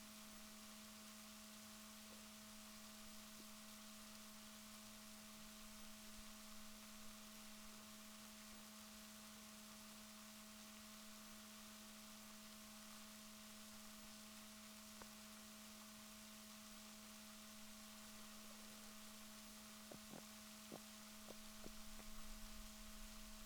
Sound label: rorquals negatif, Balaenoptera Physalus Chile